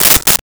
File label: Duct Tape Tear, Foley